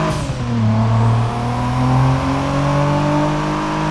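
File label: third, car engine; sgmcars; lancer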